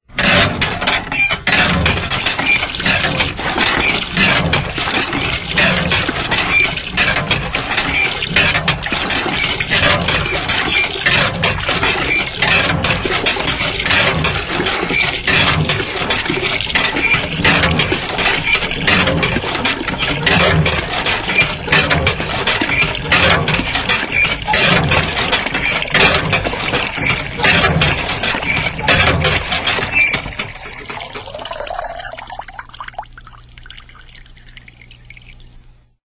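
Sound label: vodyanoj nasos, water pump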